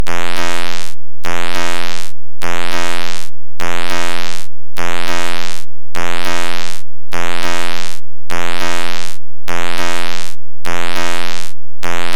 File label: Buzzed